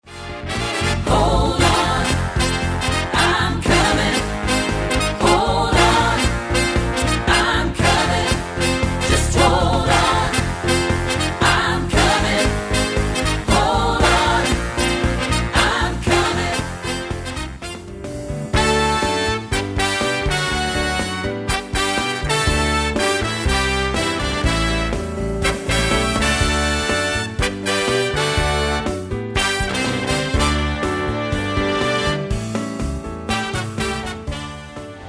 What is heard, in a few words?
Pop Rock Sample